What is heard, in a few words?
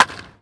baseball; 1000fx